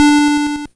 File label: GB Lead D; ChipShop Deluxe; ChipShop Hits